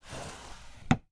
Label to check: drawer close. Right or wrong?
Right